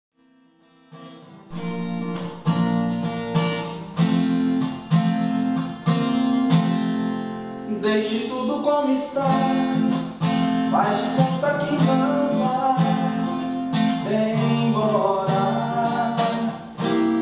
voz violão

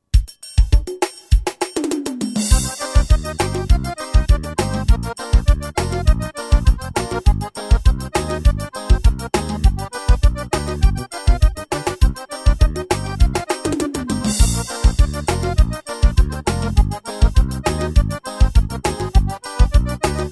forro romantico

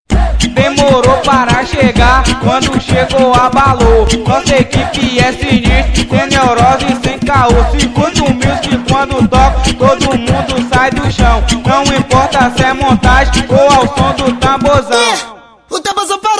o som do tamborzao

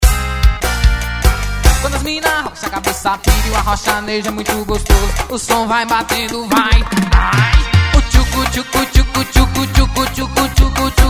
forro

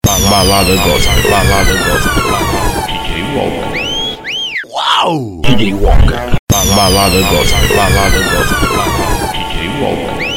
balada gospel remix

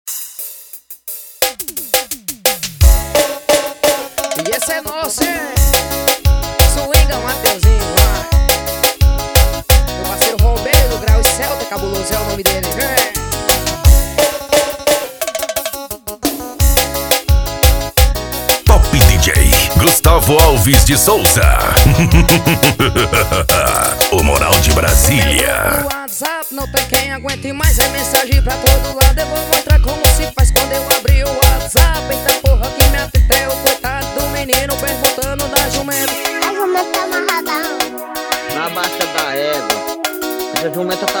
pisada swingada

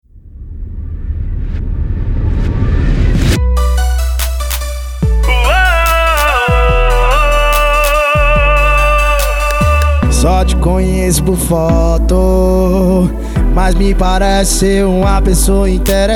piseiro

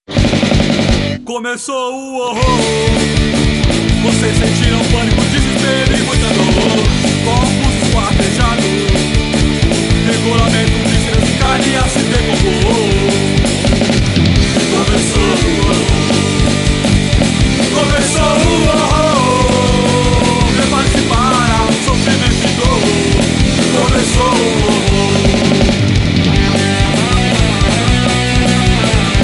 horror

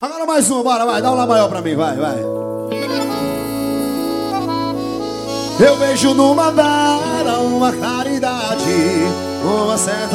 forró

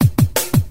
batidao irado

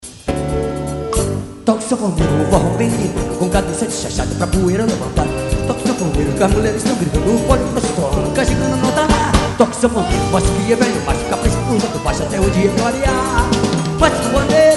forro xote toque sanfoneiro

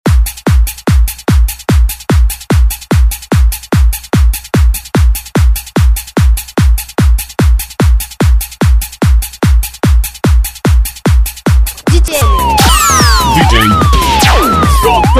sirene automotivo

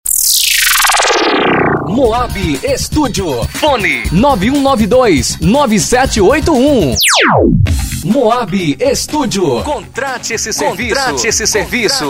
forro

